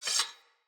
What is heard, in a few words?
Sword Unequip; Weapons; Player; Swords; Fenn Jotar Sword